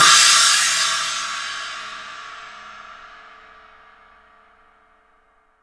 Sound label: CHINA, percussion reverb; MARKYDRUMS